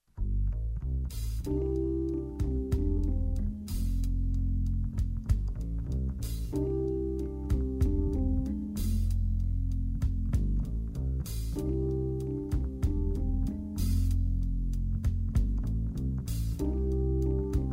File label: Quartet